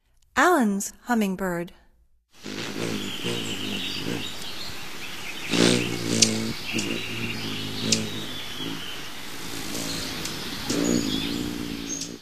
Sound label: Allens Hummingbird; North America; Stokes